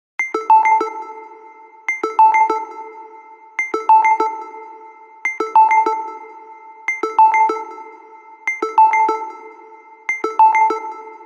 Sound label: Radar, alarms; Tinno ATT Calypso